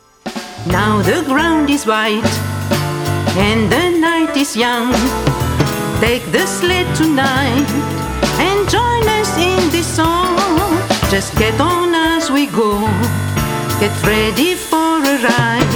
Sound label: Jingle Bells